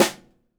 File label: SONOR snare